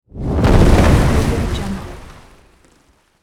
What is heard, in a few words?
long whoosh with wooden ship stinge